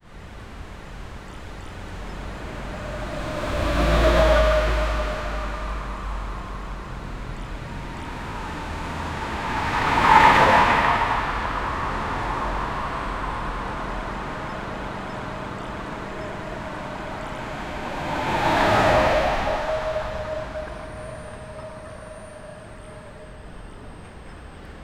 multi klaxon de voiture, busy street

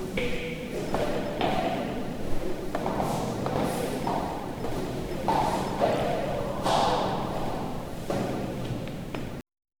une personne marche en, gym

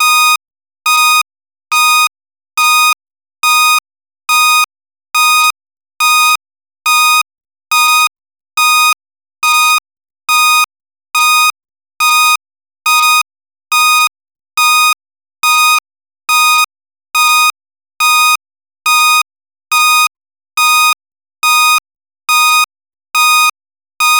un son dalerte dans un, japanese anime

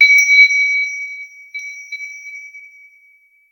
son pop pour un loot

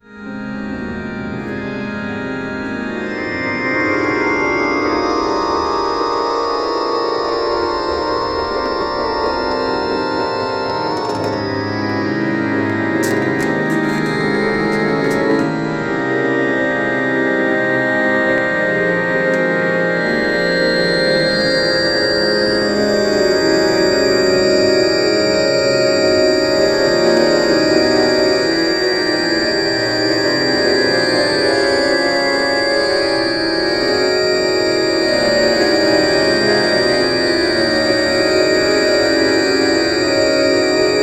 ambiance italienne acordo, accordion

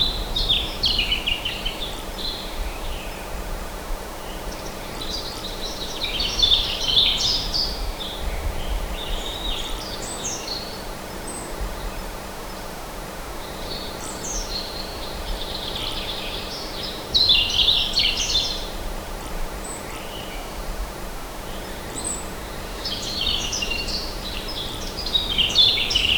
oiseaux chantant dans une

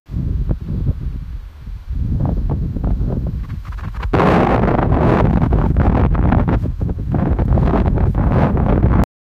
son du vent qui pousse

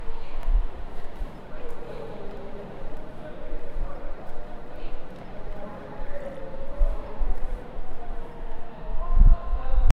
des gens marchent rapidem

